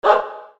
voice dog; maoudamashii

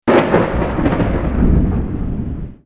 element thunder; maoudamashii

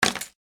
fall; maoudamashii

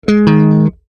instruments bass; maoudamashii

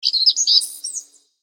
voice bird; maoudamashii

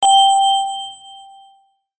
chime; maoudamashii

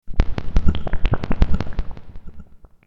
magical; maoudamashii

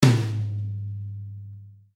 instruments drum tom; maoudamashii

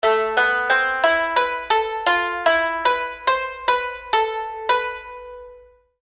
jingle; maoudamashii

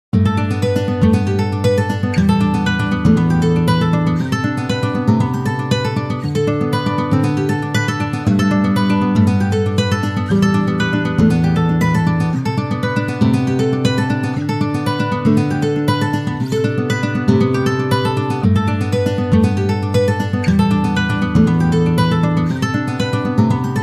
acoustic; BGM; maoudamashii

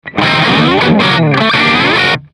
instruments guitar; maoudamashii

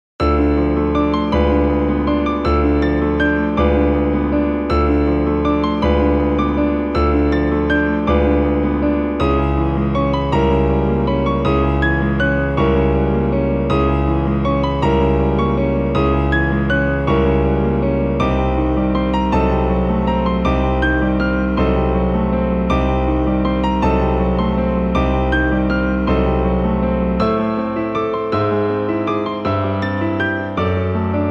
piano; maoudamashii

